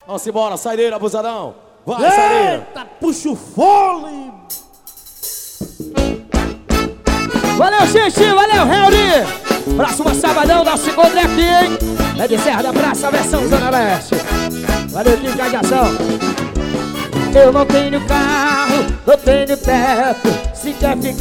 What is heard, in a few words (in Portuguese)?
forró